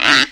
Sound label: Fairlight IIx CMI Fish, Drum Machines